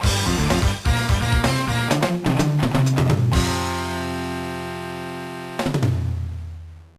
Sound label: Unknown Jingle; Eyeshield 21 Devilbats Devildays; GBA